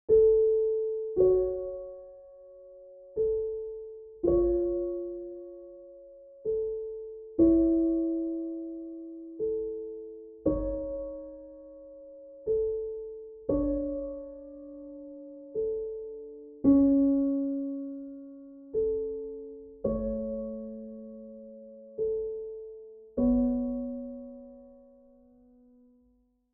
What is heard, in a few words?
Piano Dry; QUANTEC